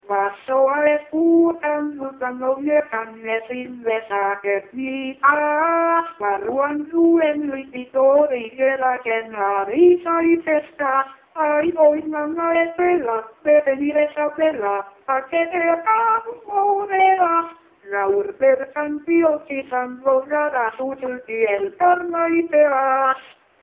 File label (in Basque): Bertso; Sagardo Bira